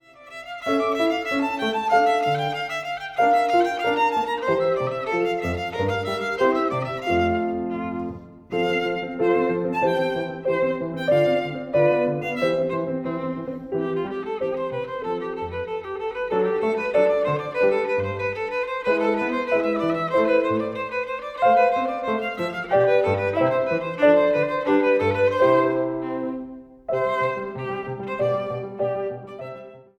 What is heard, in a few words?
VIOLIN